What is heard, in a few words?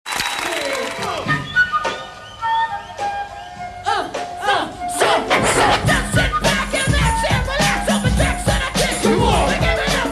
flute loop